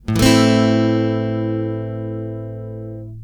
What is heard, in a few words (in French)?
accord la mineur, l’accord de La Mineur en vitesse normale; guitare